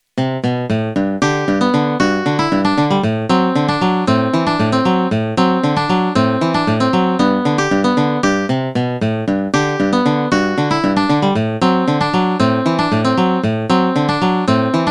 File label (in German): Rag in G Dur